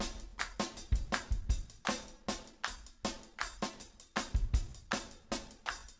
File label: 80 cp